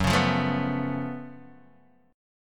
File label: Gb Minor Major 11th Gb down Guitar DADGAD